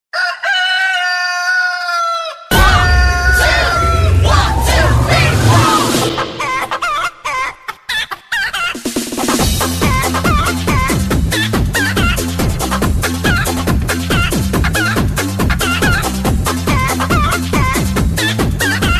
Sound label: Chicken Newyear Song